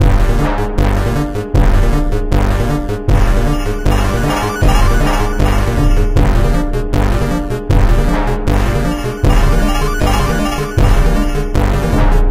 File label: Boss Lead In; crawl